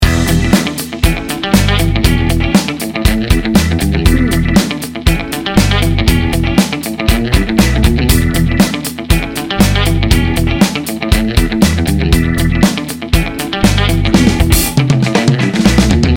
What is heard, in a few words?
funky guitar bounce loop